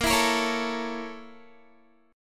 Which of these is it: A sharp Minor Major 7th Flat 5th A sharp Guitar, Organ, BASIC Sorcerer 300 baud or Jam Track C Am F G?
A sharp Minor Major 7th Flat 5th A sharp Guitar